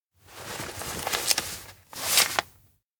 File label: cash tuck coveralls; anemoi